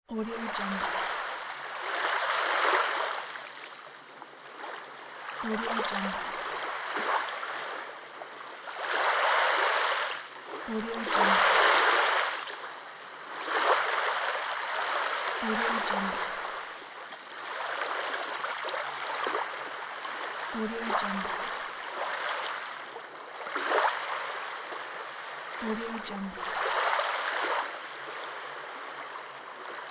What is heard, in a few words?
sea waves